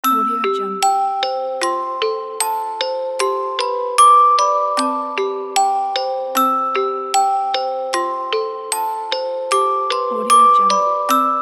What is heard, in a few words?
kids christmas music box